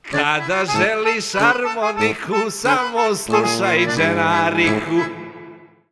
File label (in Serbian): harmoniku